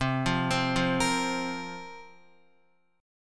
C 7th Suspended 4th, Guitar, chord; C7sus4 arpeggiated; JGuitar

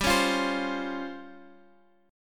C sharp Major 7th Flat 5th, chord

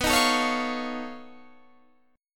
B 9th Flat 5th B down Guitar Drop D

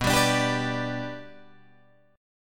B-Minor Sharp 5th, Guitar, Open G

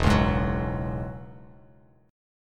E 6th Add 9th E down Bass Standard

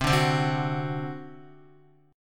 C Suspended 2nd Flat 5th C down Guitar; Csus2b5 strummed

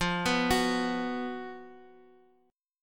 Gb Major 7th Suspended 4th F, guitar

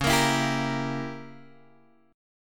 Db Diminished 7th, Guitar, Open G

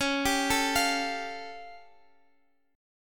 A Augmented 7th C sharp

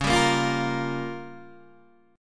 Db 6th Flat 5th Db, down, Guitar DADGAD

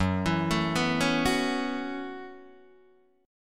Gb Minor 11th Gb Guitar Open D

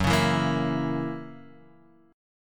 F sharp 7th, guitar

